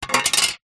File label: transport license plate down on surface